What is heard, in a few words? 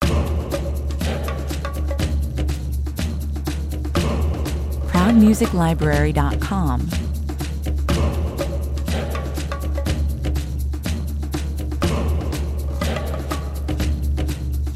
tribal 122 bpm